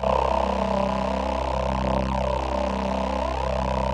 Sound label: VP-330, String Machines